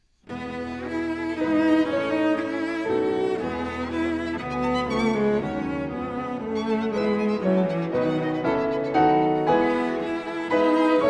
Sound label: Piano Trio in A minor: Andante con moto